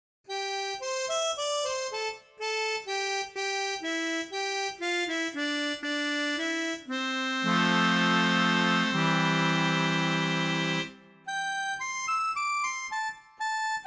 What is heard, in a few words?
OrganTeq WET